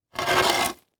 Impact Wood Drag Through Dirt And Rock; Impacts

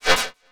Sci-Fi Electronic Energy Pulse Short; Science Fiction

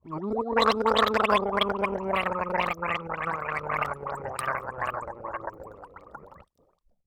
Human Male Gargling Drowning

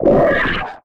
Multimedia Internet CD-Rom Flash Wipe

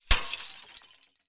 Plastic Debris Falling On Metal; Crashes

